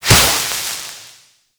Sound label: Hazard Sparks Start; Gameplay; Stadium; Warframe; Nineteen Ninety Nine